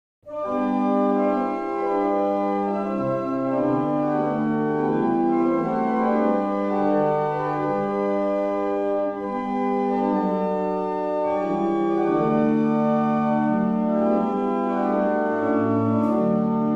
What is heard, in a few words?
Careys, barrel organs; Avington